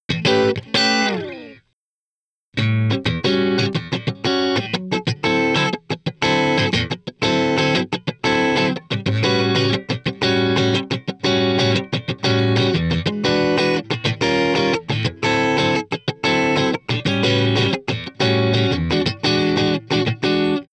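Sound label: Blues Guitar Breakdown; Digidesign; Pro Tools Essential Loops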